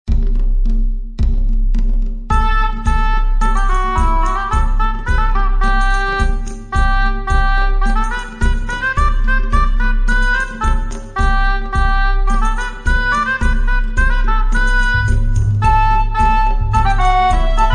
minstrel guild, ringtone

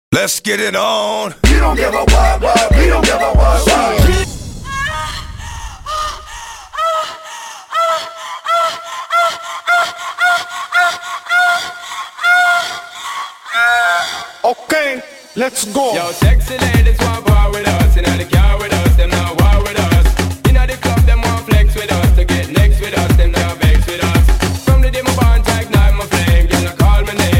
hot sound, ringtone

